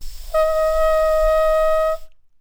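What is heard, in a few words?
Crow, Modern French Style Bassoon Reeds; Williams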